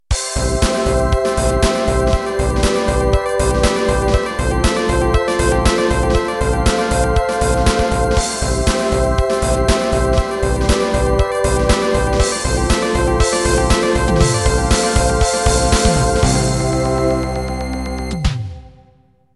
Dream end

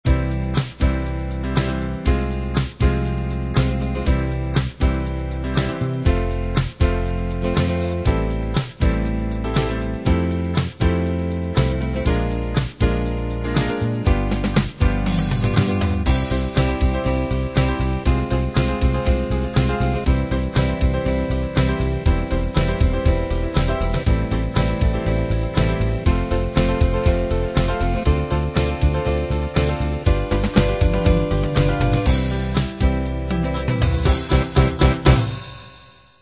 half 8-16beat

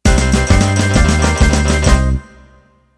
Country Shuffle End

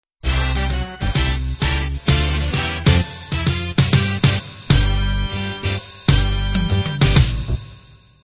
swing band intro

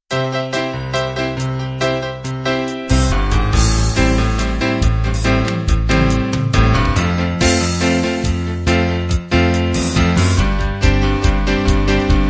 piano rock intro

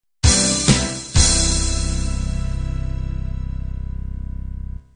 home beat end

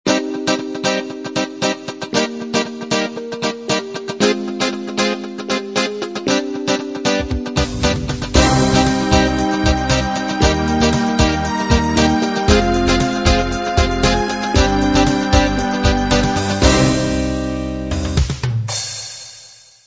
pop ballad intro

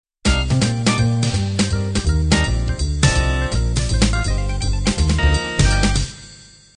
b3 jazz intro

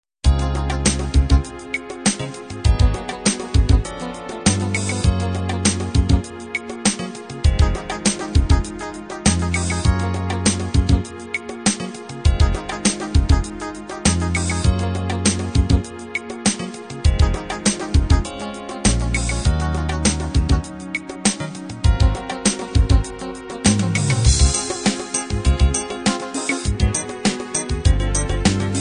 slow ballad

